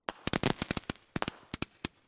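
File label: Ambience Partymode Crowd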